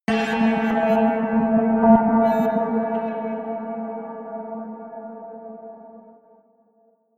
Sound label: melodic metal movement high, drones